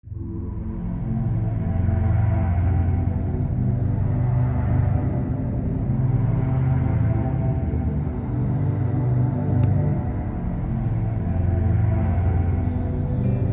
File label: Jazz Guitar Riffs